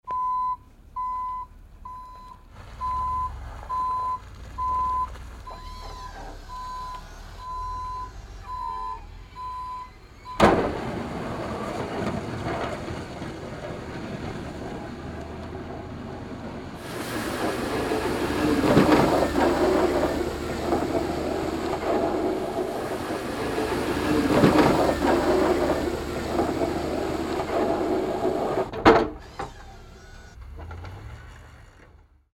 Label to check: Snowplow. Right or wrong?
Right